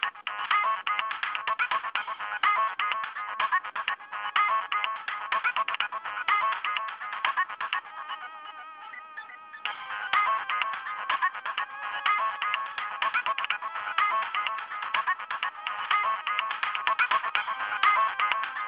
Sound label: techno